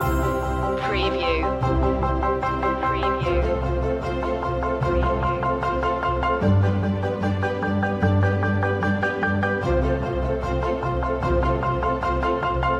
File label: building blocks loop